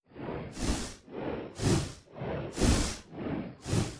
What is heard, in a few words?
Amb Train; Eve